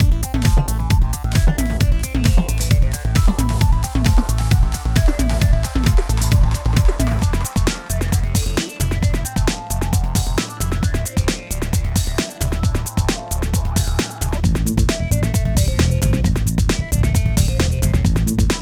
Remix Mus Lobby; Strix; Paladins

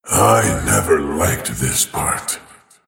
last hit pain; Dota 2; void spirit

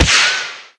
Gun Shrapnel cast; Muh Keen Gun

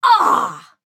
Grunt Hit; ChurchHill; Paladins

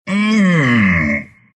pain, ru; Dota 2; Treant

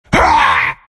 bearform pain; Lone Druid

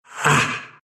pain, ru

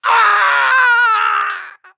Falling; Mech

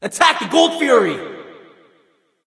Attack; Critical.DMG Ne Zha

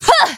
Basic Attack; Battlerite; Nomad

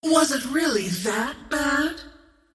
ability scream of pain; Queen of Pain

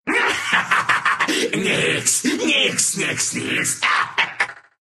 Nyx laugh, ru

